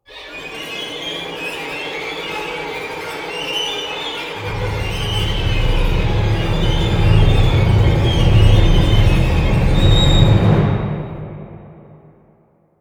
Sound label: Static